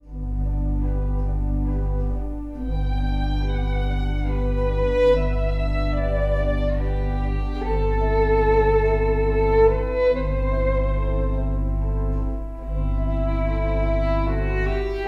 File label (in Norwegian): fiolin